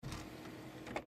Cd-Rom Drive Disc Tray Close; Computer; Technology; Computers; Blastwave

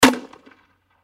Grenade Launcher 40mm M79 Single Shot; Distant Perspective; Warfare; Blastwave

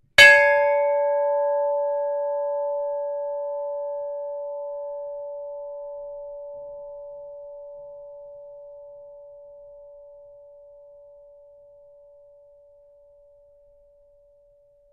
SHIPS BELL RING; Tomishima Maru 1952; Various ships bells; Boats (Sound Effects:Vehicles); SoundEffects-Foundation4

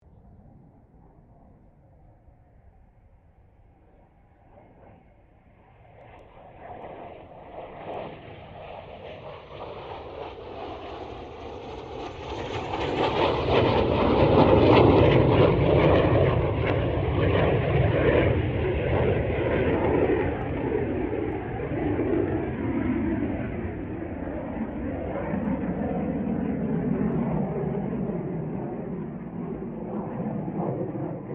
AIRCRAFT CA-27 SABRE PASS STEREO

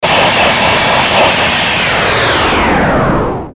Descend Power Down Static Distortion; Descending Sound Effects; Blastwave

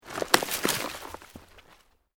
Branches Dead Break; Material components for constructing more complex sounds; Breaking (Sound Effects:Objects)

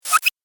Cartoon Pumping Sound

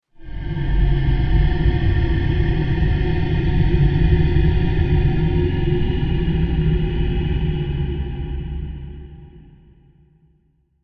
Horror Monster Growl Large; Monsters

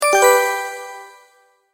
multimedia tone, Goodbye

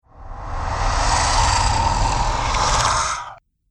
Zombie Monster Ghastly Breath; Zomby Sound Effects

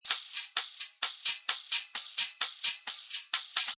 Tambourine 130

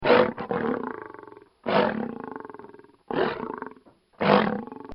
Lion Growl; Lions; Animals; Bobby Cole

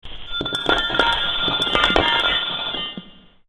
Steel Industry Ambience; Scary Soundscape Sound Effects

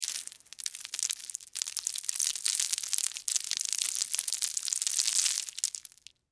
Debris Crackers, Oyster; Texture; BlastWave

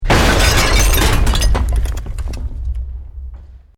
EXPLOSION MEDIUM BRICK WOOD GLASS NO FIRE

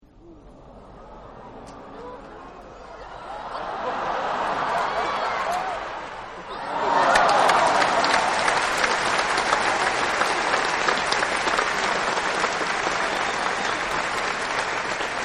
Applause Disbelief Laff Cheer; Applause Disappointment; Human

